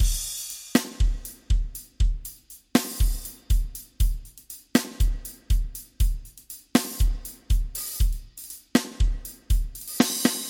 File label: SD Arrocha